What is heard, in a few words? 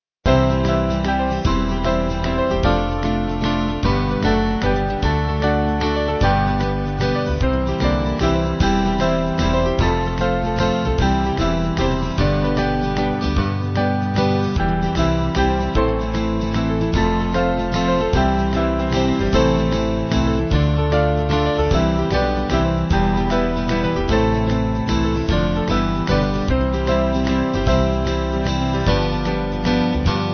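Band, Music Backing Files for Churches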